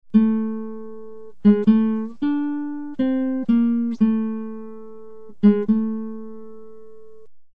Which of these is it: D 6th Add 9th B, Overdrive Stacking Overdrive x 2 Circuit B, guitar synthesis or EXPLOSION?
guitar synthesis